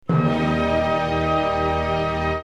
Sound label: accord